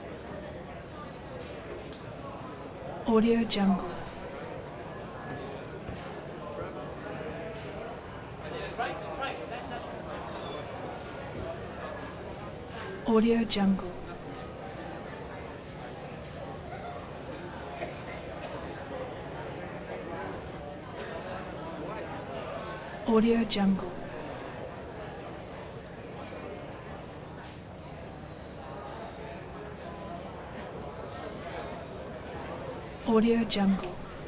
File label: crowd mumble; prosoundfx